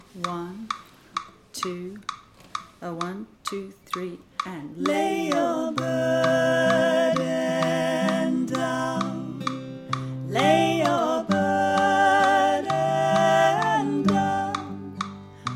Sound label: alto-sop click panned